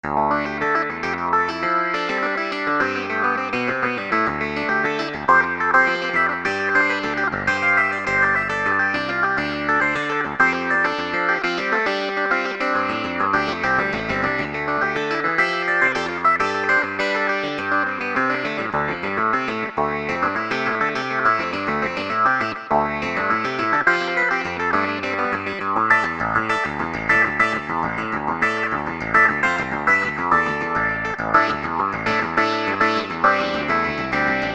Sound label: Proggy Clav, hohner; pianoteq7